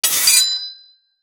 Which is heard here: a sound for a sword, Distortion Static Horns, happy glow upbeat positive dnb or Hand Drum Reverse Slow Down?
a sound for a sword